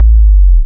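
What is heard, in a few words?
bass drum, drum machines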